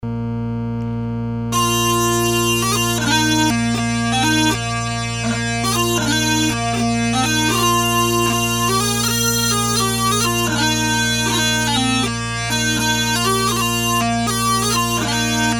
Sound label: Pipe Band March; The Guelph Pipe Band Centenary March